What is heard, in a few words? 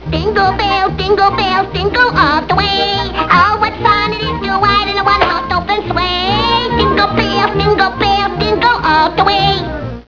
jing bell